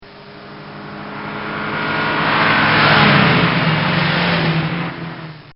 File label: Volkswagen Drive-By; Transportation; Bobby Cole